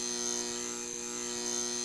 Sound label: hum